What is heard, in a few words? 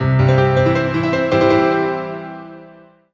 MUSIC EFFECT Solo Piano Positive; startide macos switch windows gamerip 2017